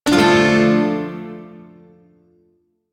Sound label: Maj Chord B; Shawzin; Warframe; Duviri Shawzin